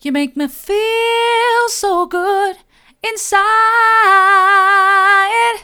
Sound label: mid, vocal